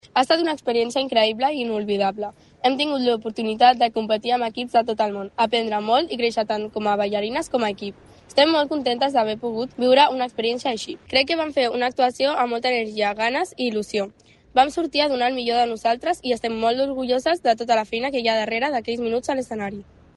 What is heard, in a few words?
hip hop; mundial de hip hop